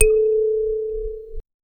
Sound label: strike the kalimba softly